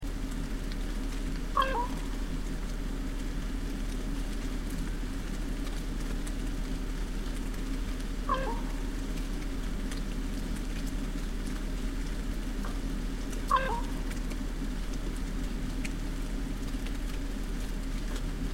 raven

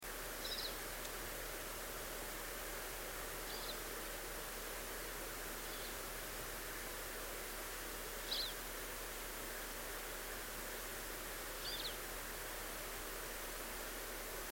pine siskin

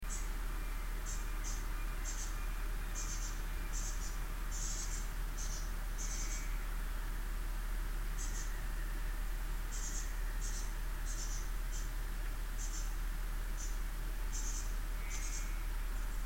winter wren

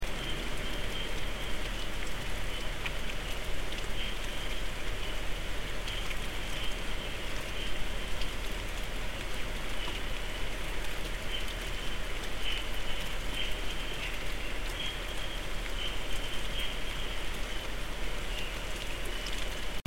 chirps

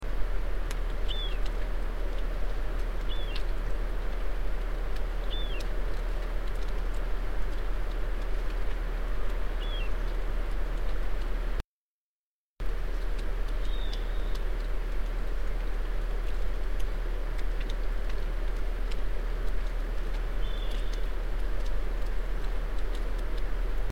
mystery calls

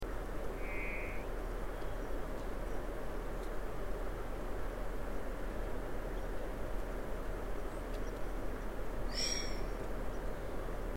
varied thrush

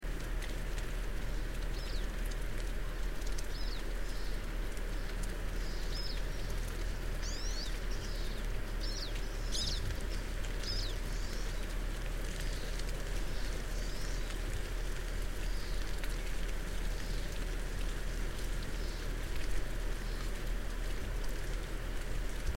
pine siskin

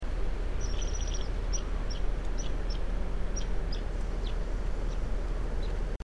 red squirrel

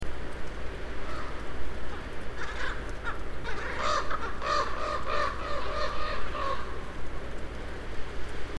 ravens

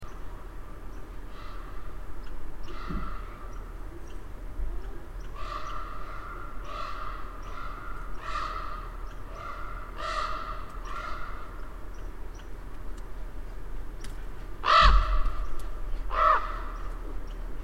raven calls

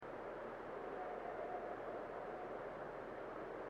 mystery call

